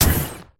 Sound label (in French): Saut